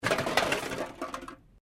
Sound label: Can Softdrink Rubbish; various metal cans; Metal (Sound Effects:Materials)